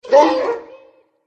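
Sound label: cerberus wound; tserber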